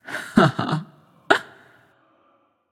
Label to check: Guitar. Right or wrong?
Wrong